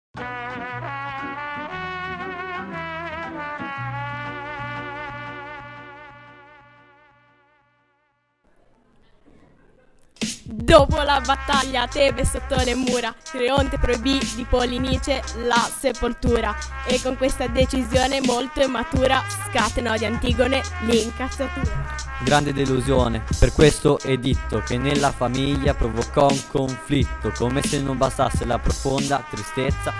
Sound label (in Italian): rap con musica; Antigone rubrica